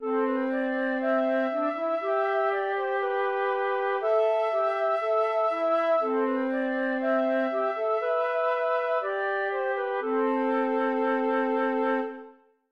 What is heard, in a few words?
wobbling